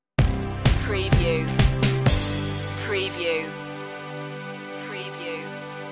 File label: ultraviolet stinger